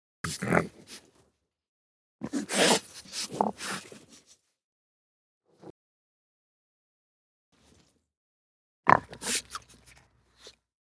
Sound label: Raccoon Snarl Breath Eat